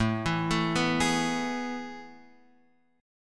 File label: A Major 7th Suspended 2nd, chord; AM7sus2 Chord {5 7 7 4 X 4} arpeggiated